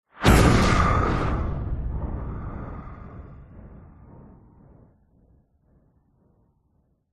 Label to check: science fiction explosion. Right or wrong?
Right